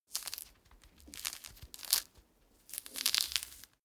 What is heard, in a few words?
mandarin peel sequence